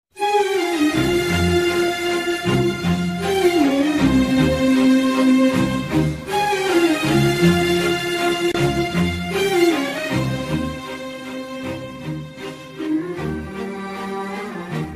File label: movie emotional bgm ringtone, sad bgm ringtone, heart touch; raja rani